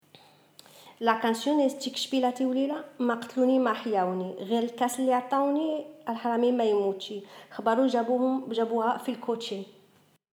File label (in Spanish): Canción Tikchbila, Marruecos